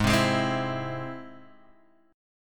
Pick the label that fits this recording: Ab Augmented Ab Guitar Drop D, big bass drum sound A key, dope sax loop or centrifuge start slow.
Ab Augmented Ab Guitar Drop D